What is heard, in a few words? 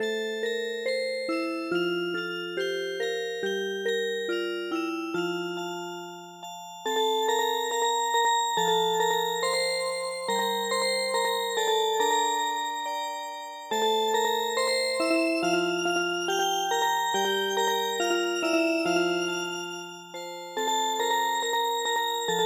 Music Box, japanese lullaby; traditional; Hagoromo no komoriuta